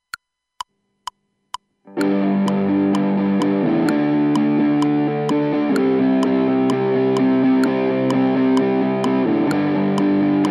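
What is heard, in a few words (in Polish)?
refren osemkami bez tlumienia, guitar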